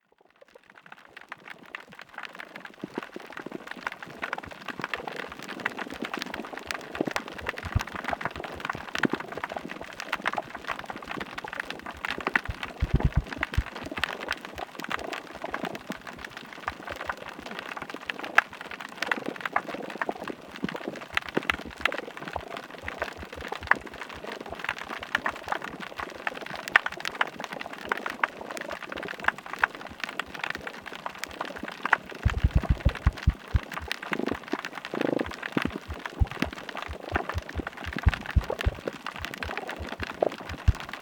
澎湖 海底

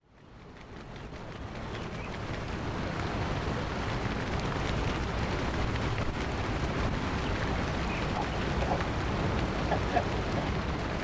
汐止鸕鶿

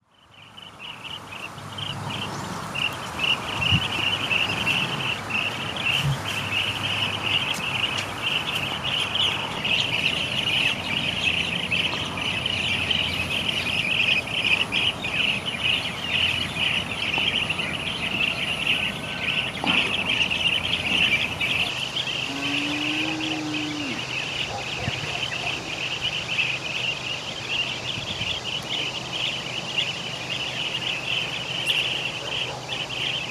栗喉蜂虎 金門青年農莊

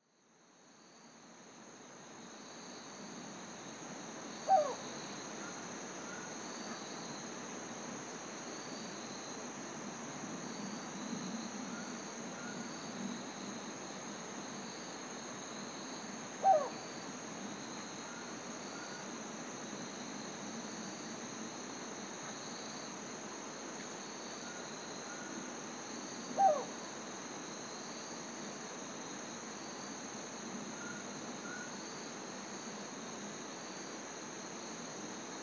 新北市汐止

